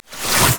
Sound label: bramble zone splash; LoL; zyra